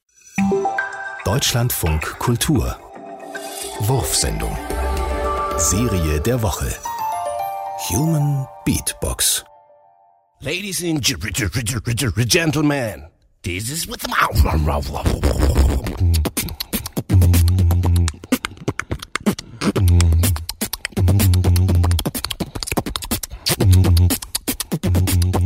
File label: human beat box crazy beats; wurfsendung